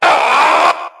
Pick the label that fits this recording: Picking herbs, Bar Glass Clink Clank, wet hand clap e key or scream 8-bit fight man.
scream 8-bit fight man